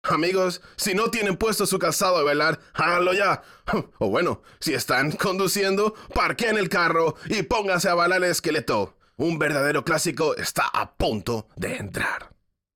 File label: Music Vehicle Radio Events